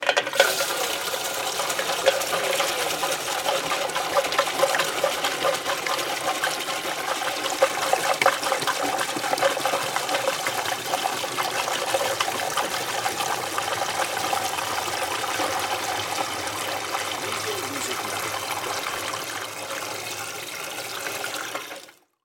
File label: Gas Can Fill